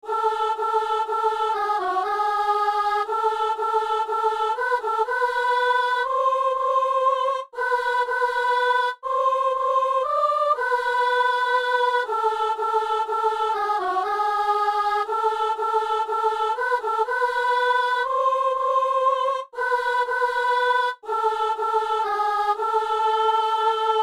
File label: soprano; Messe du 17 janvier à Saint-Denis; Laudate Dominum